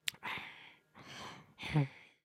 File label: Sleep, creatures organic; Lego; Strombie